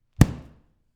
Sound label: Loud Pillow Hit